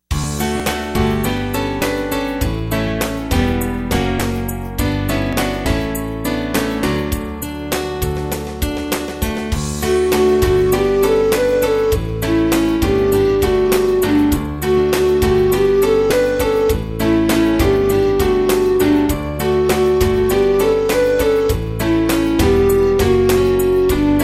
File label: Hymns; Sunday Service